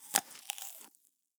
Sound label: Apple Bite Crackle